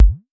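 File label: vermona drm1 mk3 bass drum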